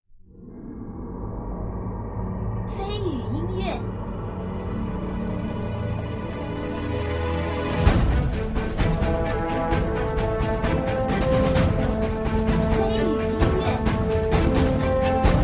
大厅登陆音乐

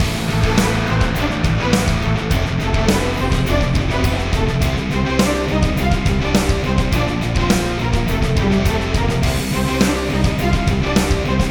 跨服战背景音乐

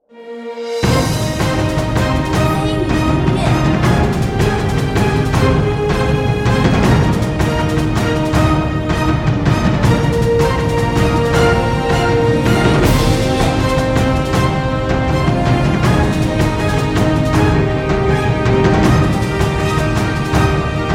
战斗 激情 励志

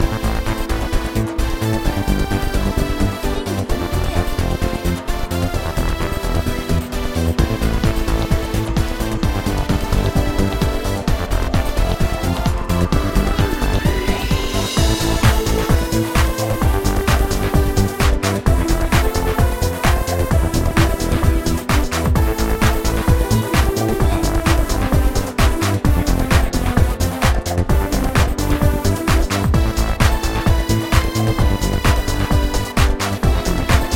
林地战斗

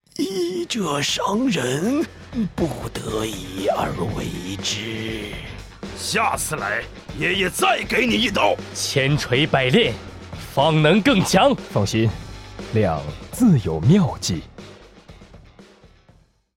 男 BGM

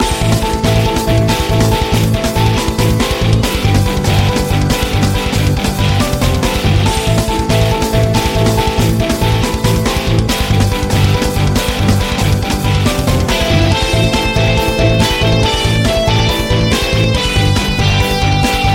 普通战斗

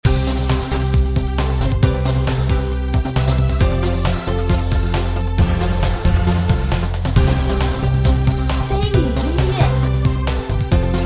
欢乐 热血 胜利

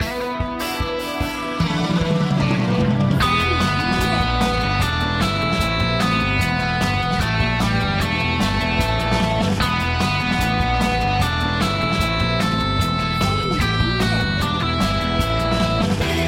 战斗普通

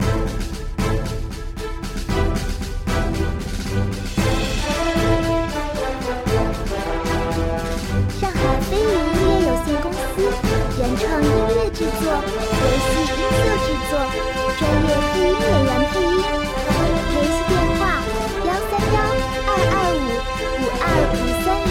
雄壮激昂登录城镇; 神契大陆; 主场景

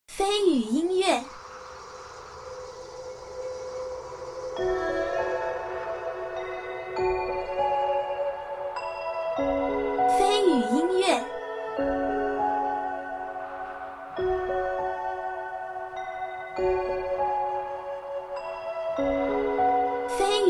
儒雅 诗意; 寒霜阁

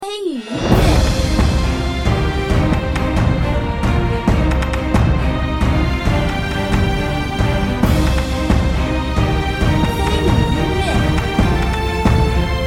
磅礴 悲壮 沉重

